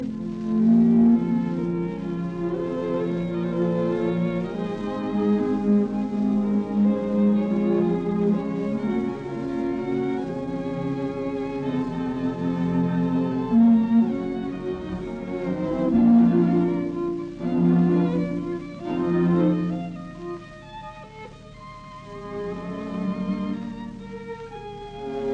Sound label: String Sextet in B flat major Allegro ma non troppo; Brahms; Op 18; Adolf Busch